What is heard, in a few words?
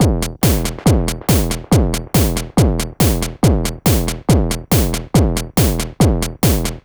Drums, 140 BPM; CHIPSHOP DELUXE; CHIPSHOP LOOPS